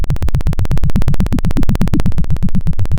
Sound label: Sound of a plane taking off